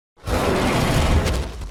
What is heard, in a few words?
roar; Balzarr